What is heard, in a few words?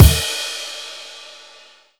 Kick; osu